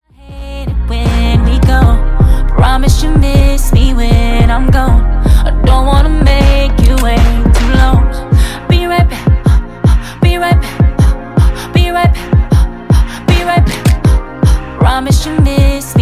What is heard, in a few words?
Funk 78.5Bpm